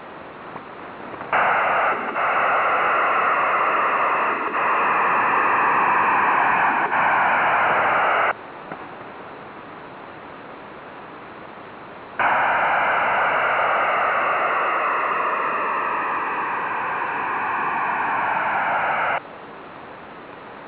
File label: AHFDS non FHSS; HARRIS AHFDS in non-frequency hopping mode; HARRIS ADVANCED HF DATA SYSTEM; PSK-systems